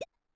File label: collect; grunk; New grunk collection SFX